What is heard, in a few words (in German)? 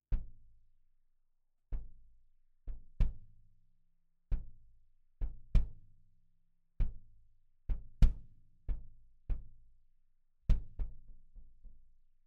DPA 4055 Bass Drum Aufnahme Reso Loch Eingang